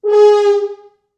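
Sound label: French Horns; East West; Quantum Leap Horns Sax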